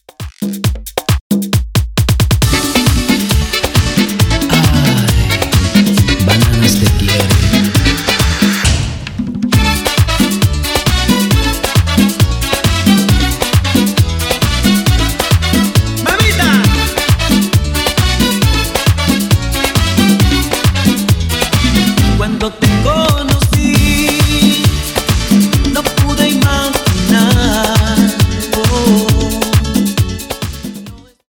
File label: Remix Intro Outro 135 Bpm